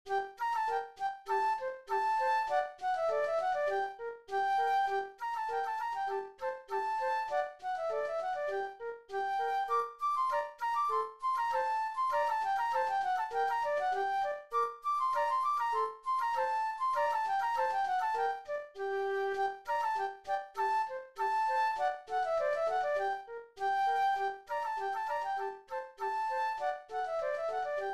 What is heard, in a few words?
Russian Polka Flute Duet; classical; Glinka